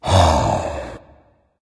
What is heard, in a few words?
sigh dragon; fafnir